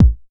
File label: 808 BD; TR808+909